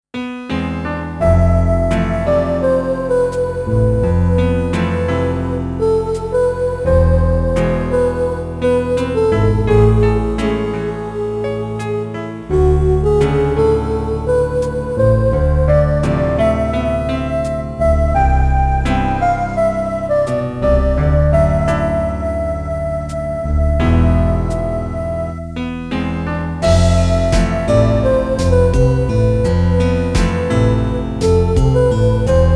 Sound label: loving guitar's serenade; aj; lamiroir